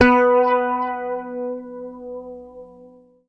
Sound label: B, Bass; Sampleheads; Will Lee Bass Library